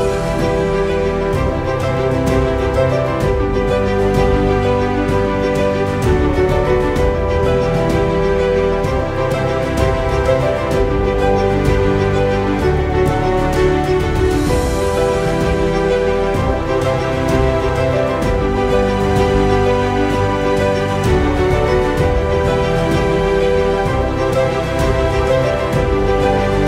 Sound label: Vivo Ringtone